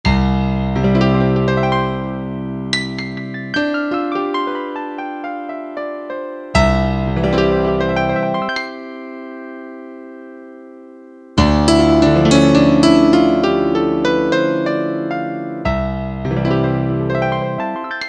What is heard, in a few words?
Concert Grd, Korg MSC-9S Organ